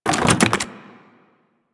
Weapon Drop A; Weapon Drops; Grineer

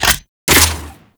Rifle Reload; Grn Kuva Lich Rifle

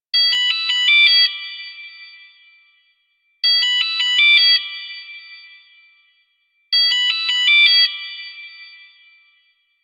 Labs Hub Pager Loop; Props; Warframe; Entrati

